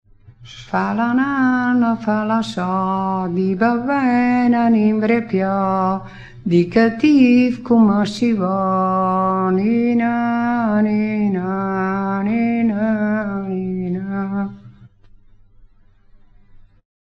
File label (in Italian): fa la nana fala so, romagna